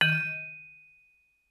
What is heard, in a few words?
Vibraphone dampen ff Eb3 stereo; MIS Pitches - 2014; Percussion